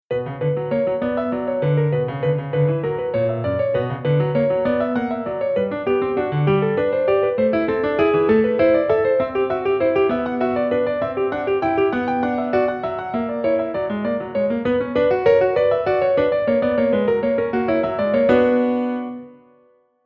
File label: Minuet In B Minor